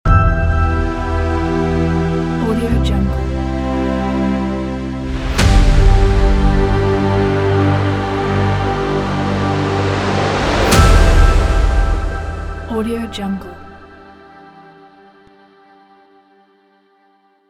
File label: hopeful intro logo; marblespace